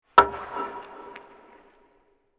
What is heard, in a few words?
Hockey Shot; iMovie '08 Sound Effects